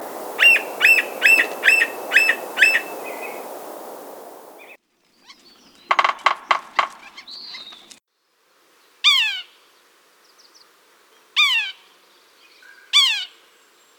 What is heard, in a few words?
Red breasted Sapsucker